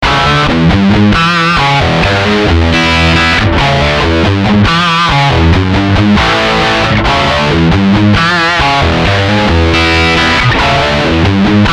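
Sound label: lead, guitar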